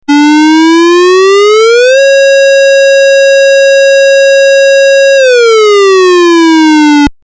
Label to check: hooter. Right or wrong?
Right